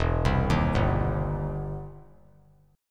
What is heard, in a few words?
E Minor Major 7th Sharp 5th, E, down; EmM7#5 arpeggiated